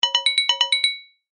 ringing

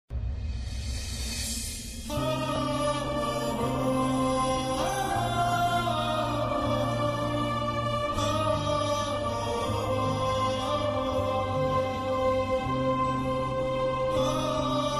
sad song; Bollywood

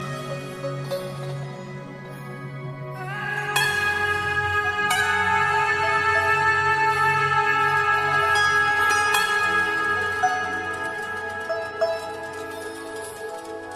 instrumental; Indian

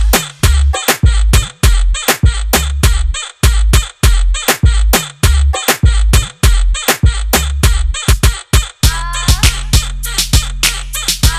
super squeeze beat; Hip Hop

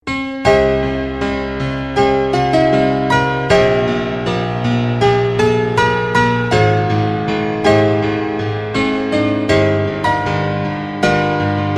piano; Attack On Titan

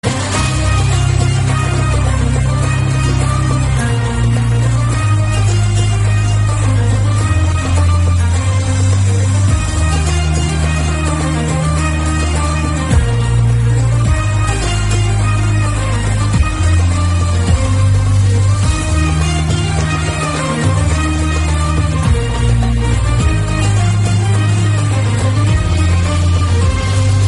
best arabic music ringtones; Dance

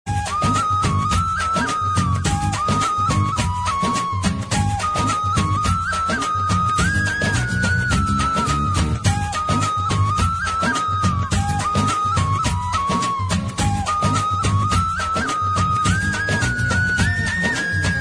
indian flute

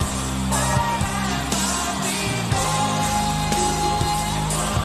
vocals

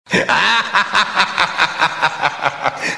halloween laugh

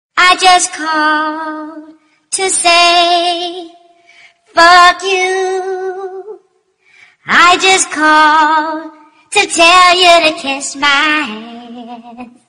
ring tones; Baby Voice

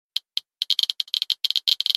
geiger counter

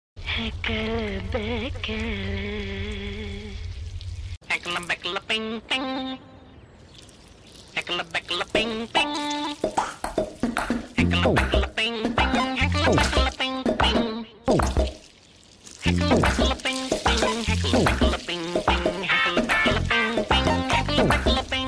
ping gems; Country

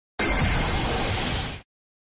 hit; Tokitobashi

